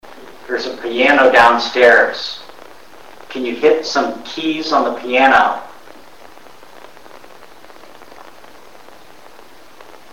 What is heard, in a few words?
Piano, Raw